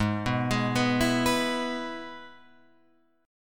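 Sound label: Ab Minor Sharp 5th chord, Guitar, Open F tuning; arpeggiated; 8,6,8,6,7,6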